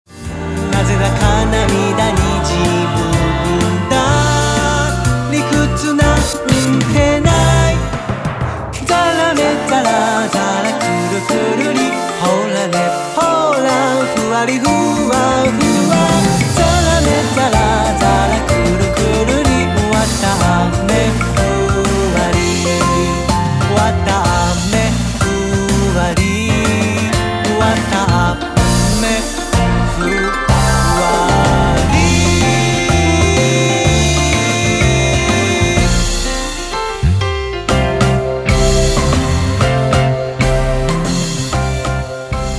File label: solo